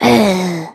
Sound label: death; Gnomes; OldThink